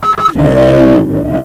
hunter alert, ministrider

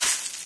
grass, player footsteps

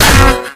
melee guitar; source engine; left4dead2

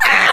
squeal, infected die; npc; Left 4 Dead 2; Source Engine

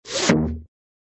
thunk; cartoon; hannah barbera cartoon sound fx

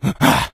become alert, infected

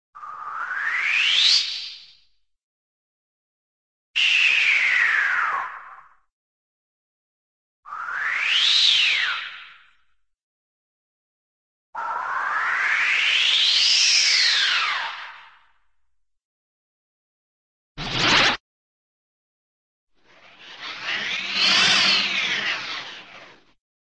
sci fi spacey swoosh up spacey swoosh down; cartoon

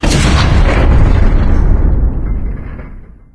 floodgate stop, machines

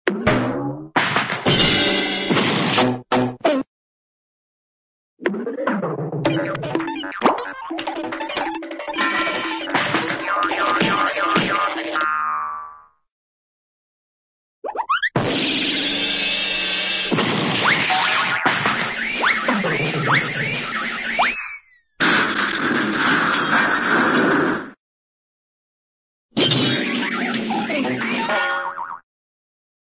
fight; cartoon; fred and barney

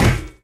physics turret impact sm

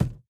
phys cube, physics

